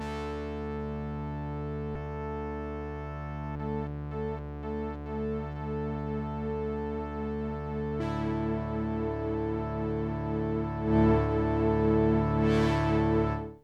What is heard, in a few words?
A drone